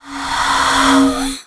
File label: stone die